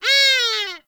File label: Forest Animal Cries; Warframe; Grineer Forest